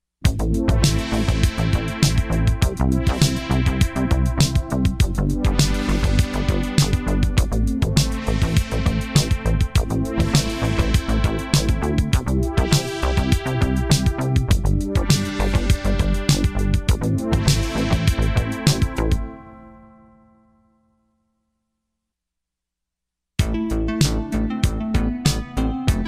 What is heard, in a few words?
crumar bit 99